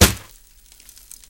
sand, impact bullets sand; hl2

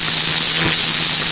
electric, func train; hl2

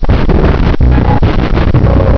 ambient roof top war; hl2

